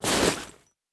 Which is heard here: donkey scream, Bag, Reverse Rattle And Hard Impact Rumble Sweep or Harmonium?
Bag